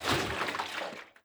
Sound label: contents full, metal barrel; physics; props